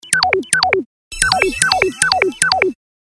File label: Squirt tone; Samsung; GT-S3650 Corby